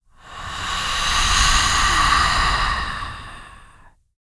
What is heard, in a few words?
VOX FX, BREATH FX